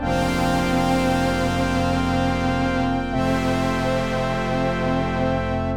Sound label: F drone